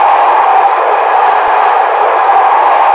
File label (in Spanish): INT DERRAPE LLUVIA, F1; AOS F1 2022